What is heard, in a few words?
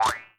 trap bounce; Crypt of the NecroDancer